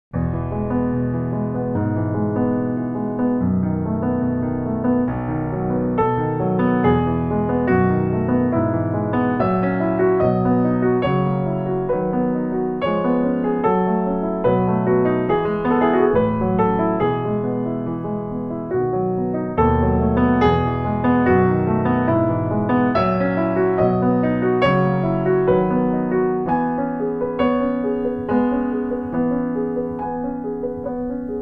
Classical Piano; Schumann Kinderszenen Op. 15

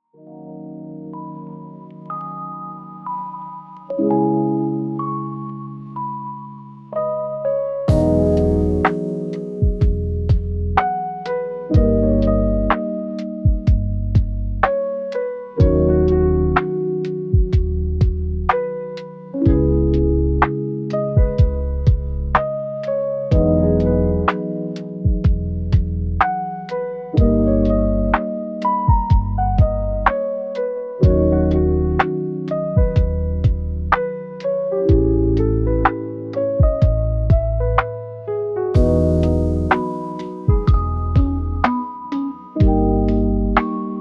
lofi

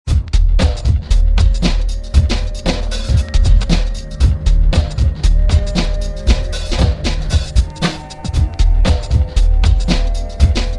Koto

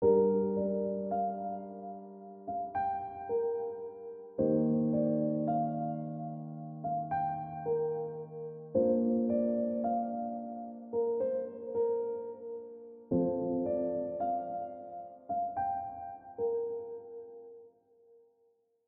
piano

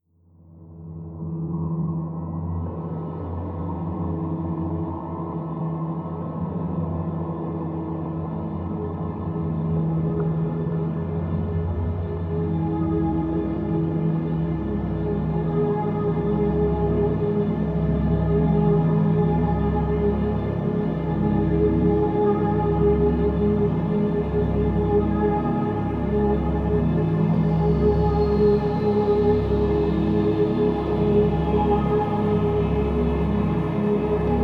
Baby Relax Music Sleep Waves

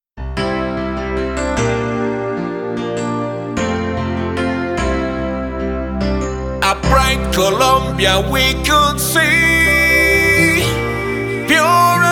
Gospel

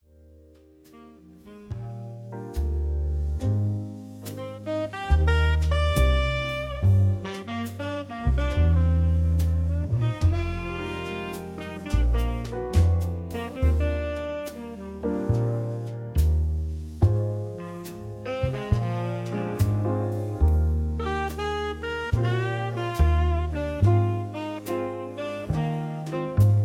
Wedding Jazz

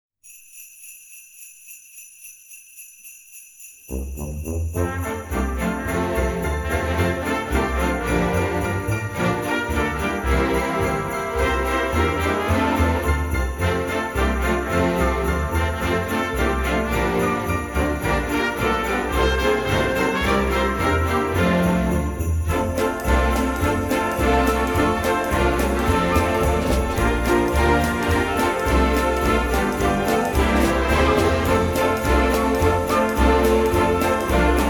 Jingle Bells, Orchestra

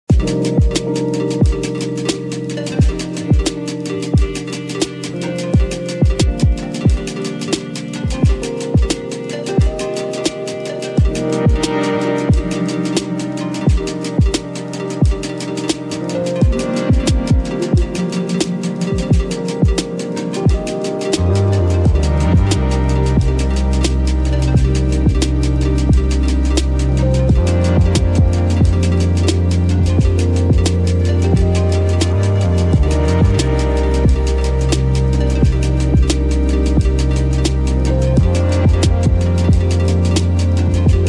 LO-FI BEATS

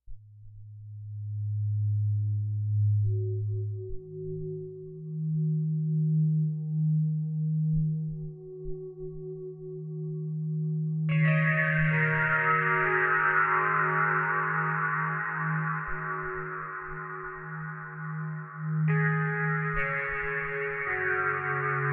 Soothing White Noise

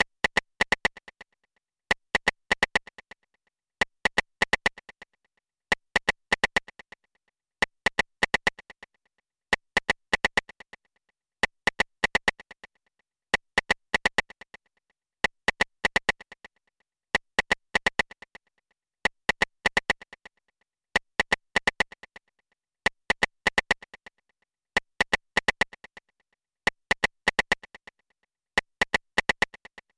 Synth Perc 126 Bpm

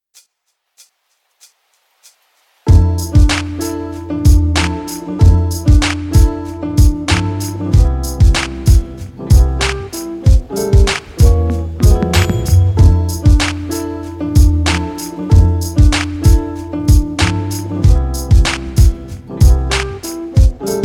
Instrumental

